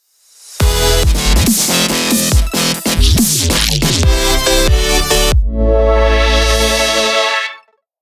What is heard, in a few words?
Dubstep Logo